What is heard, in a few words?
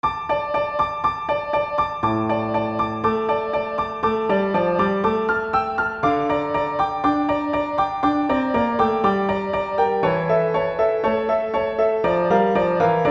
After Piano